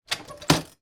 household folding chair fold down

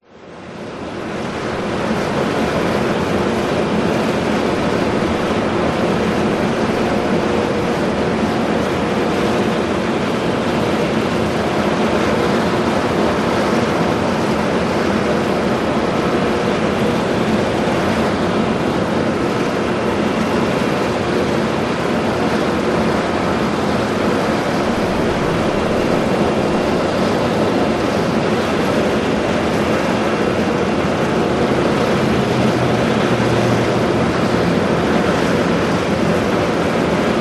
USA Los Angeles Inglewood Industrial Exhaust Fan

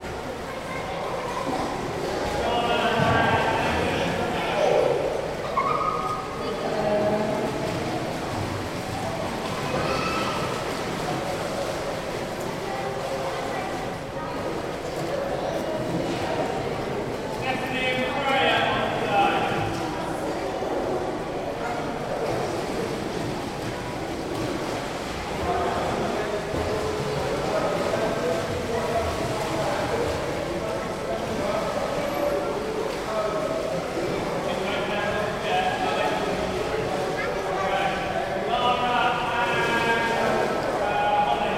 ambience swimming pool lessons in bg; sound effects one

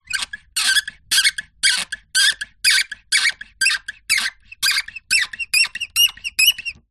foley wood balustrade turn squeak; zapsplat

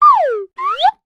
cartoon slide whistle descend then ascend

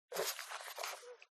DOG Pug Smack; ANIMAL; smartsound

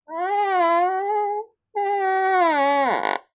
household door creak whiny; zapsplat

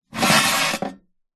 air fryer oven metal grill tray remove slide out; household

